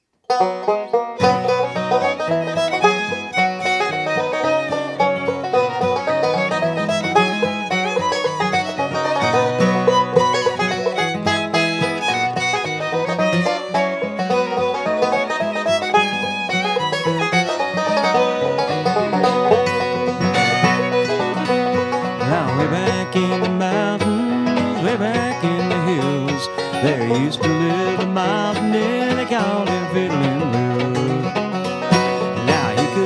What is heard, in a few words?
sawin on the strings